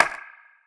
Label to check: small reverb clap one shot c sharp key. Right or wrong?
Right